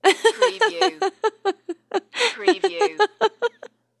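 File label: Woman Chuckle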